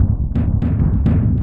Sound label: oil drum rumble, Drum N Bass; Sonic Foundry (Sony Creative Software); Knutrix Drum & Bass